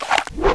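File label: crowbar draw; weapons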